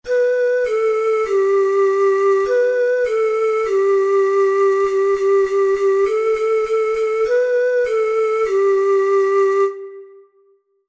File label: hot cross buns, ocarina four hole